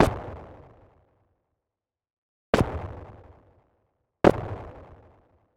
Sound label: Disparo Gunshot